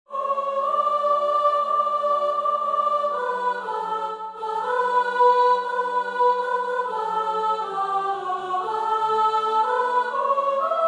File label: Alto